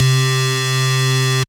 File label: C Synth, One Shots